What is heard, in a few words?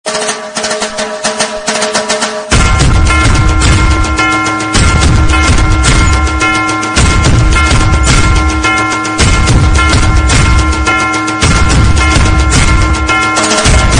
GANPATI INSTRUMENTAL MUSIC RINGTONE